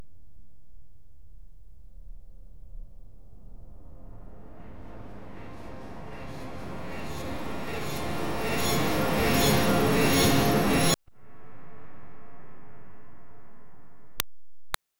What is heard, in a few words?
a magical time slicing; surreal